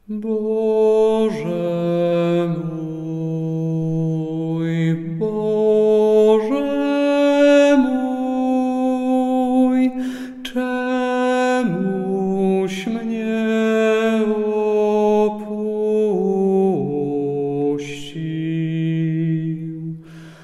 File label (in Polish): sopran; Wielki Czwartek; spiew na triduum